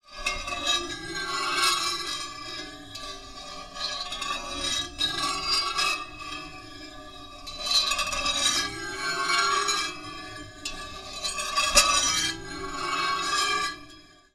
foley metal object scrape on pan lid pitched down horror